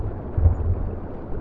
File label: worm burble; Rockworm; Barotraumatic